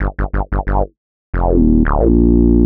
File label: Bassline 90 G, hiphop, 90bpm, Bass; musicradar